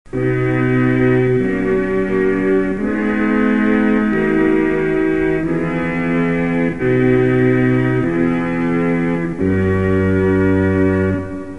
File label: french horn